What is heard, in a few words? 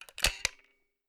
lock into place